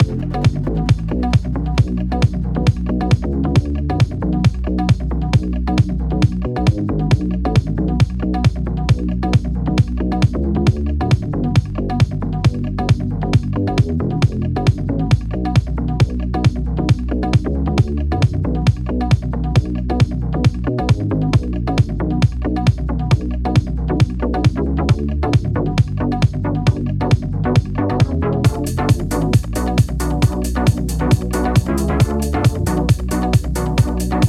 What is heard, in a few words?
techno